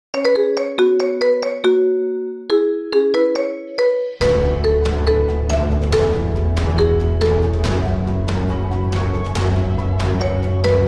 marimba; attack on titan 2

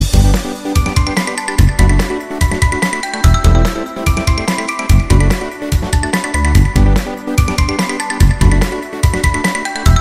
Movistar Original

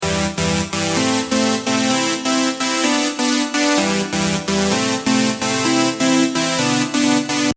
electro synth

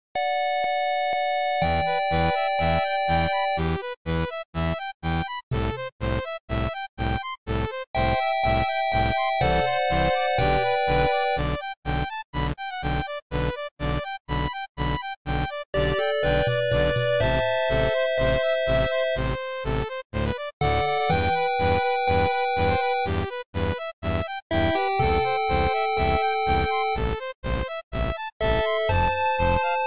8 bit; champions league

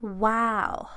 guaauu

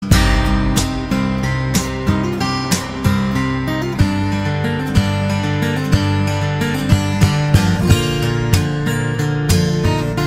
guitar

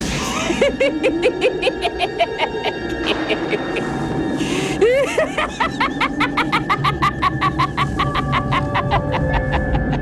laugh risa; chuckys

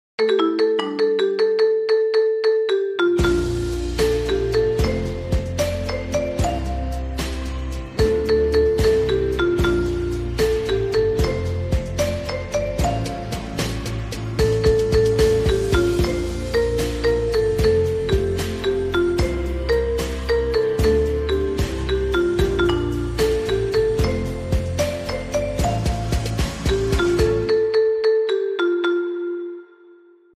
marimba

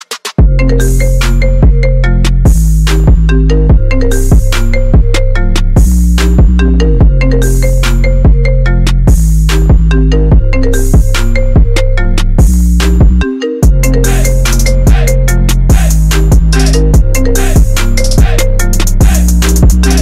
iphone se

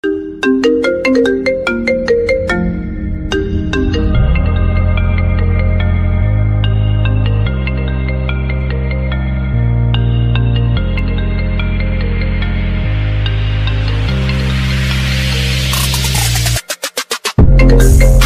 iphone

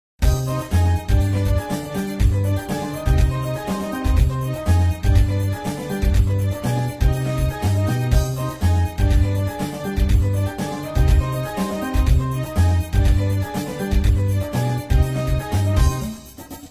latin loops; motorola

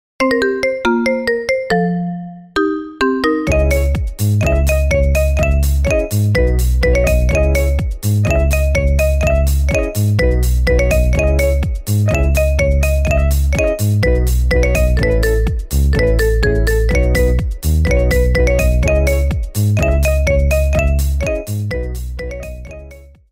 marimba

